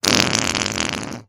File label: fart